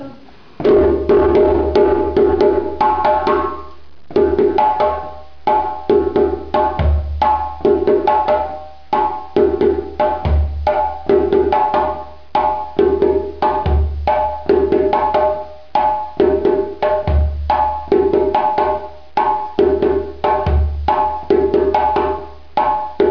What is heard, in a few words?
Ritme